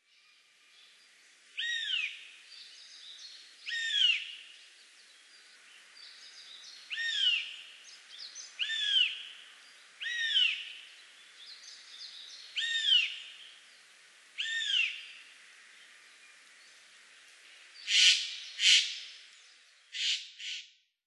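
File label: Eurasian Jay; kakesu; utsunomiya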